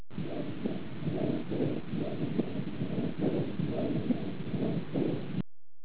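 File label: whisper, pulmonary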